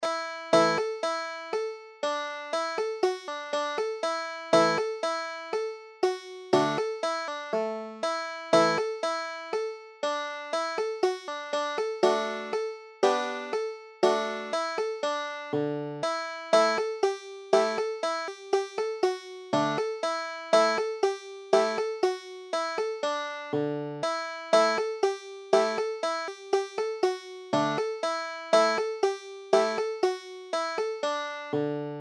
rye straw, banjo

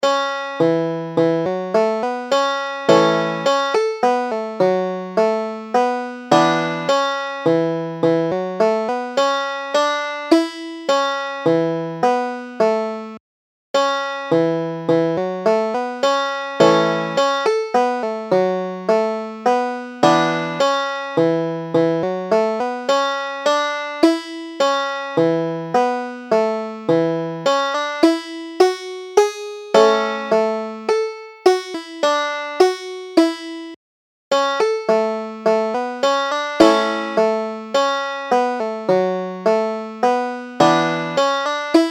margarets waltz, banjo

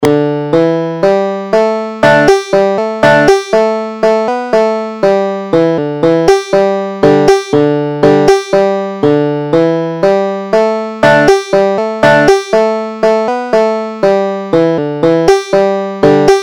elk river blues, banjo

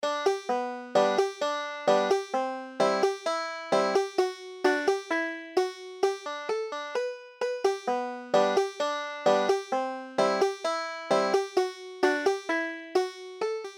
banjo

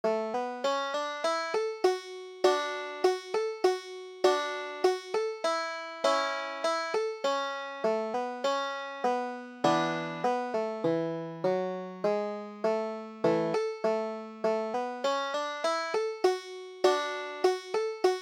jolie blond, banjo

